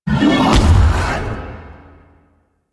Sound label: Rising Blades Cast C; Warframe; Warframes; Pax Duviricus